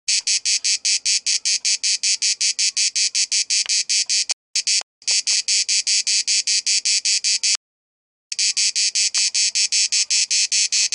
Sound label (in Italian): la cicala suono inconfondibile dell estate